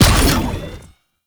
Exalted Sniper Cast; Warframe; Frumentarius

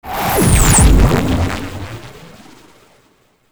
Antimatter Null Star Cast; Warframe

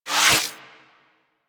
Electricity Shock Burst Fire Stop; Oxium Osprey

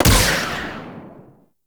Rifle Shot Initial B; Warframe; Zariman Grn Wraith Rifle

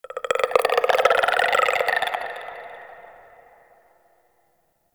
Infested Tendon Chatter; Warframe; Corpus Infested; Ambience

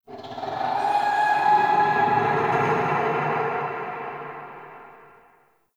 Growly Overtone, Ambience; Warframe; Shadowgrapher

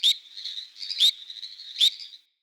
Day Close Critters, Ambience

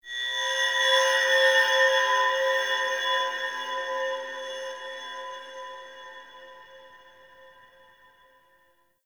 Sentient Texture Medium, Ambience; Sentient Lair

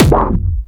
Air Pistol Hit Effect; Crp Air Pistol

